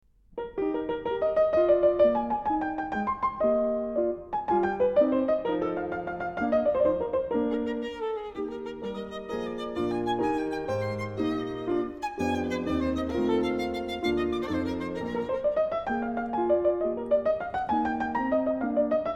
Violin Sonata in E flat, Variazione; Mozart; K481; Variazione Vl

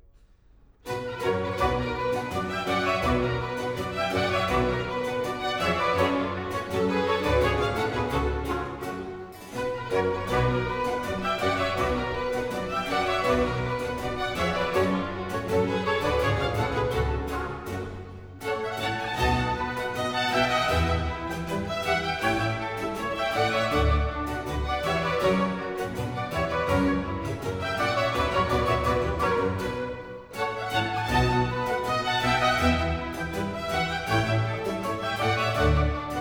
Ouvertur, Allegro molto; Vivaldi - La Senna Festeggiante (2002)

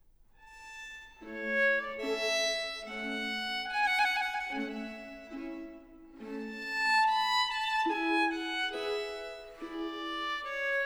Concerto for Violin in A major, Largo; RV 352; Vivaldi - Concerti per violino III 'Il ballo' (2009)

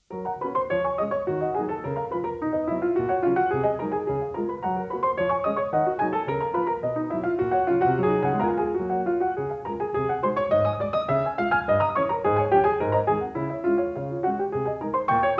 Etudes, op.25 - No.9 in G flat major, Piano; Chopin; Claudio Arrau

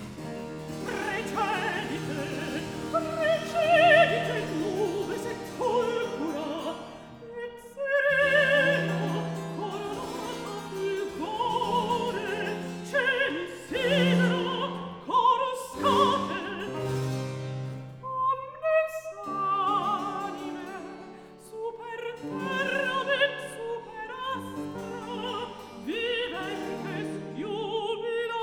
Recitativo; Antonio Vivaldi (1678 - 1741); Vivaldi - Mottetti (2003)

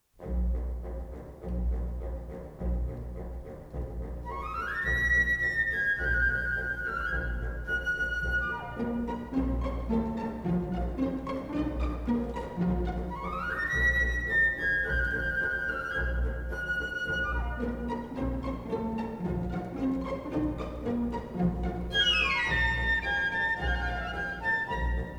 Chinese Dance, Ballet Suites; Tchaikovsky; Nutcracker; Act II

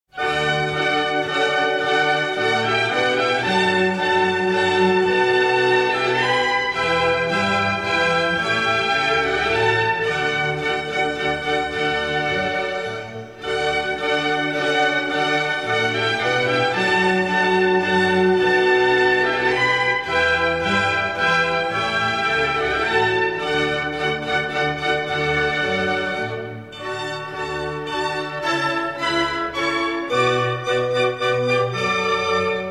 Menuet, Suite No.2 In D Major; Handel; Water Music

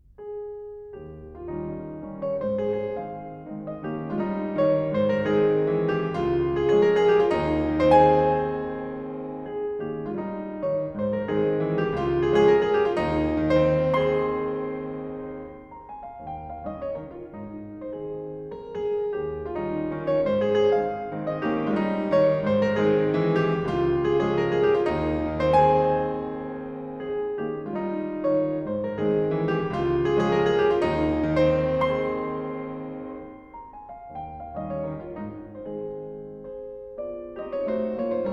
Mazurka in A flat, Piano

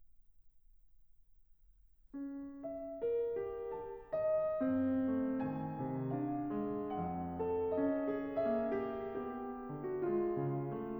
Preludes for Piano Andantino grazioso

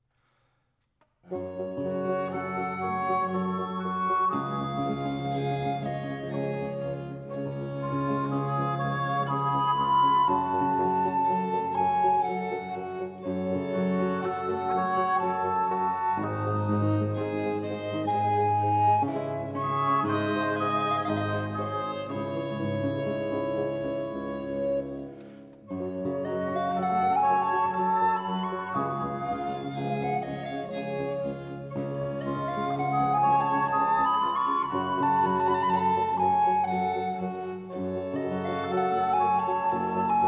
Concerto in G major, Largo; Antonio Vivaldi (1678 - 1741); Vivaldi - Concerti da camera (2003); RV 101

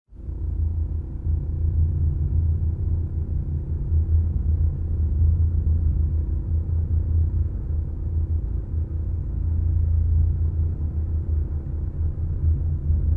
Orchestra

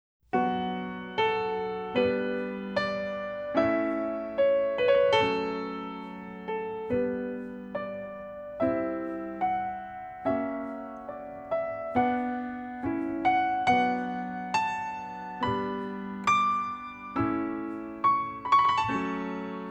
PIANO SOLO

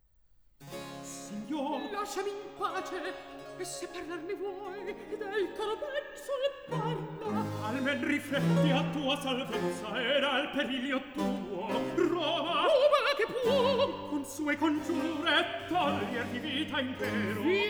Recitativo; Atto III Scena 1; Decio, Ottone; Antonio Vivaldi; Ottone in villa (2010)

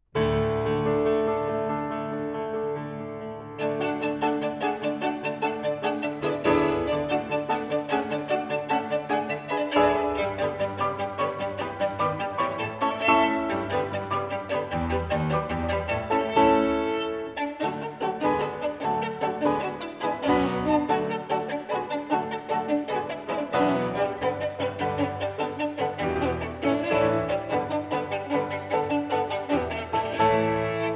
Romanian Polka, Violin; Bartok; Romanian Folkdances, Sz.56; V. Rumänische Polka

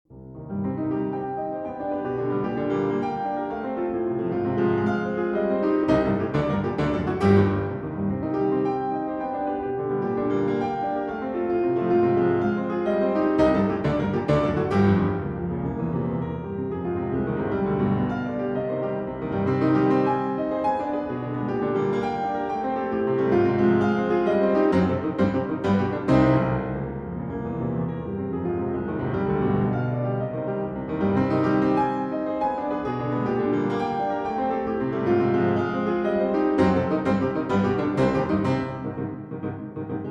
Piano Sonata, Allegro Molto E Vivace; Beethoven; No. 13 Eb Op. 27 No. 1

